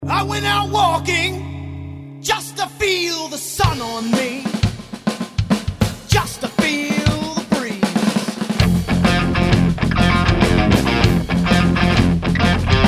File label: saturated